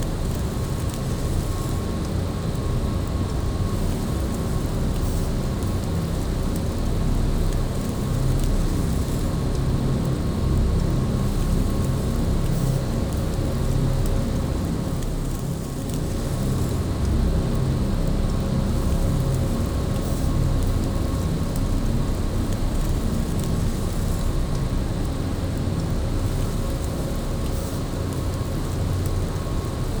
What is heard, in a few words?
ship destroyer idle; Stellaris; toxoids; ships